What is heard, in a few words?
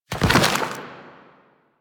Ground Spike Dissolve; Warframe; Zariman; Void Angel; Abilities